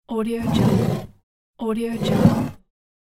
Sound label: glass sliding on the wooden table; catsoundstudio